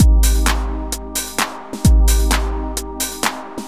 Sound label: 130 C trance break, Drum & Perc Loops; Trance Explosion Vol1